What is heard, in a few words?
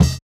Kick, jungle breaks drum sounds